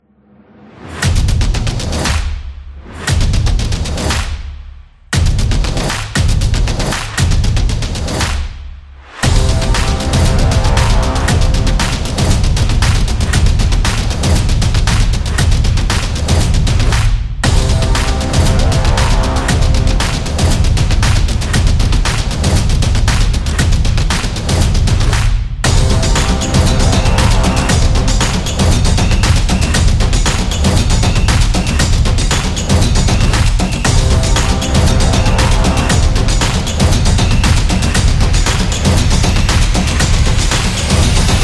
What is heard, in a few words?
Sport Beat; action sport